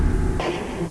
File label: engine Stop, Train cl158; OpenBVE